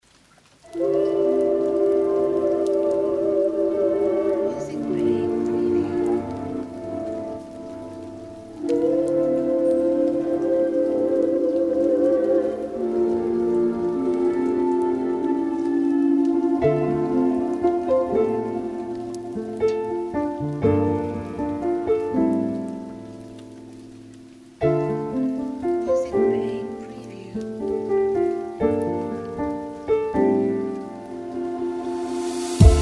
Chill Rainy LoFi HipHop

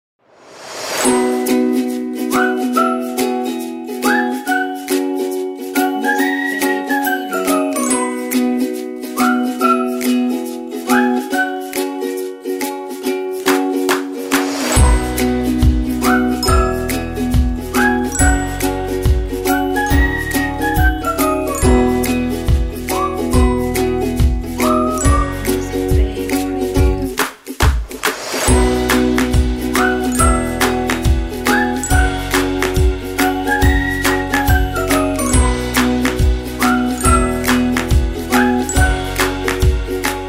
Cheerful Kids Ukulele

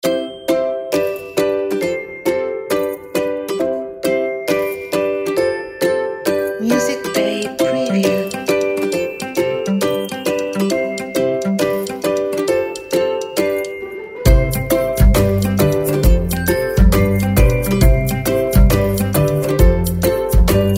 Bouncy And Joyful

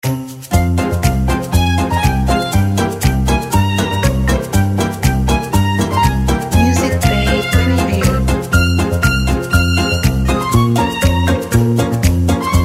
Jewish Jig

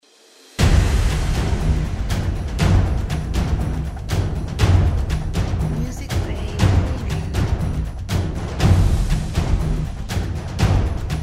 Epic Dramatic Trailer Drums

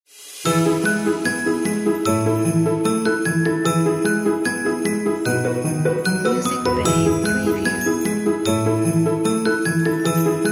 Christmas Carol

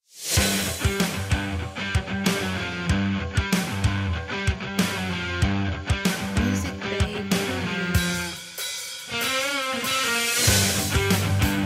Energy Rock

